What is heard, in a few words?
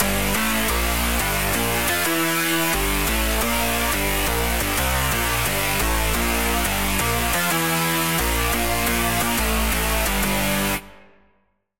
Lead, Sylenth1; Routed Sound; Sylenth1 Expansion - Chaos Bringer Vol.1